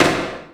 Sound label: Snare, Kit; Sonic Foundry (Sony Creative Software); Esoterik Beatz; Annoya